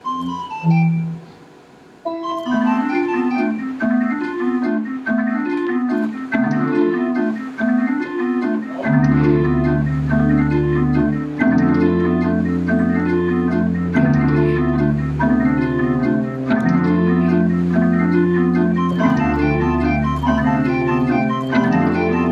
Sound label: Husavik Organ; musical mementos